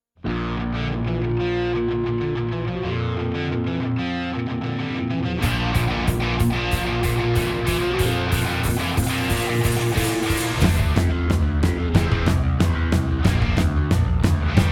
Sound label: Instrumental Mix